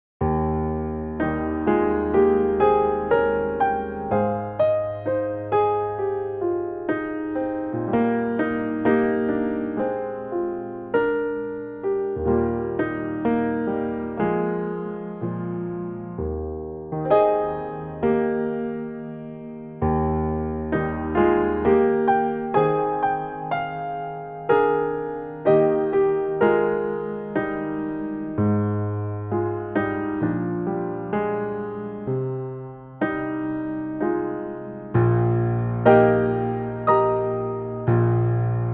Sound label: Piano